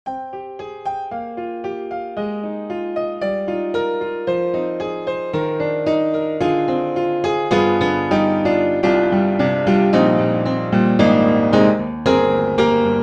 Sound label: Upright Pianos Modern Upright Modern Upright Piano II; Synthogy; Ivory II